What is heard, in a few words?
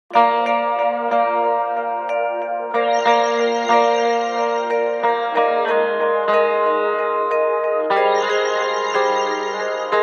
Shore alarm; Sony Ericsson; T650